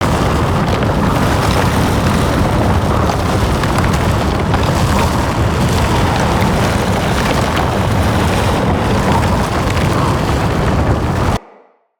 Siphon Beam Loop; Encounters; Collector

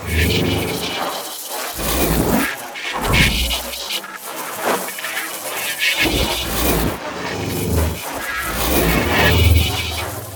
Nanite Swarm Loop; Sentient Devourer

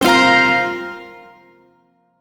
Shawzin Pent Min Chord; Music; Warframe; Duviri; Chords

